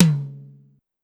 Tight Tom Hi, Drum Machines, Clavia DDrum AT, Pitched Up; 300 Drum Machines